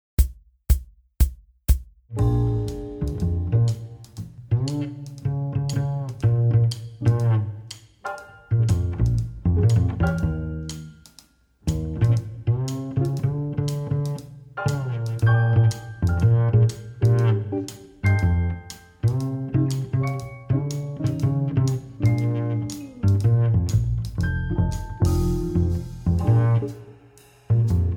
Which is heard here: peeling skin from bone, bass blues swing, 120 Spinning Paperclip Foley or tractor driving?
bass blues swing